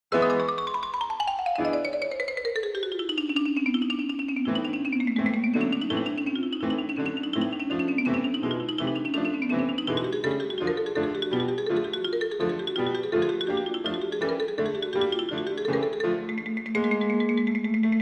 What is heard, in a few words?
Slow; Flight Of The Bumblebee